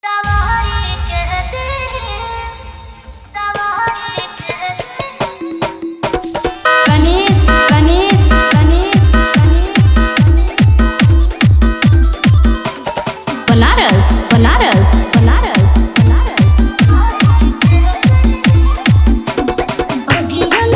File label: New Bhojpuri Dj Remix Song